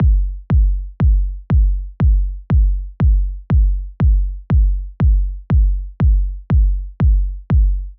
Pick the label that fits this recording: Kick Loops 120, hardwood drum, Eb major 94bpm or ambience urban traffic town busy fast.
Kick Loops 120